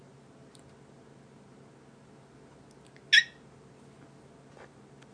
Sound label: chirp; one two three